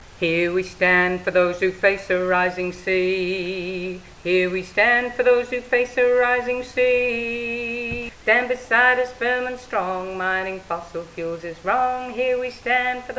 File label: stand beside us low harmony